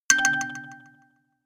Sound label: notification kalimba delay third